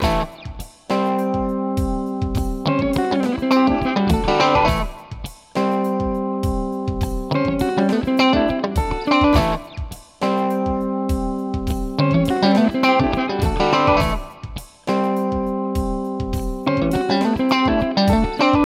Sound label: TnT and Drums